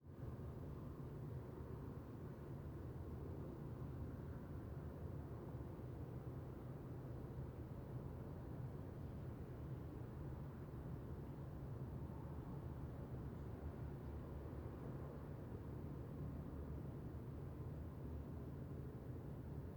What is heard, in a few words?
quiet night countryside distant traffic, ORTF; aXLsound